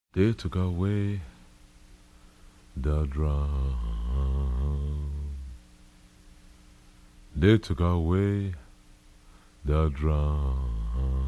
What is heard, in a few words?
Blues